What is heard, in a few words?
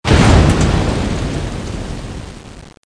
car explo